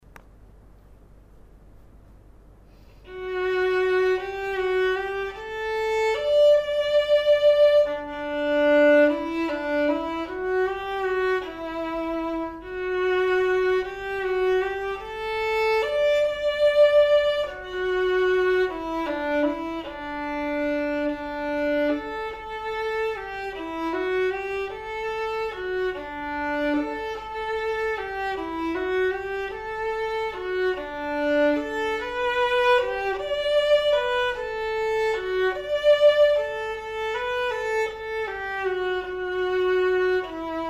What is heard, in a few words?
perf vln